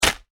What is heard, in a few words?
Foley Bag Plastic Drop Full Heavy; Drops; Objects; SFX Bible